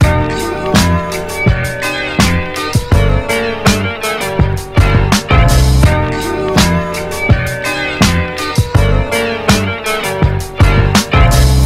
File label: loop, music; Professor Fartsalot